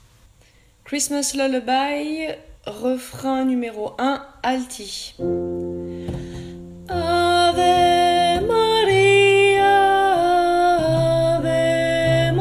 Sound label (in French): Alti Refrain; pupitre alti; Christmas; enregistrements de travail